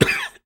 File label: human cough single male 30 years; sound effects one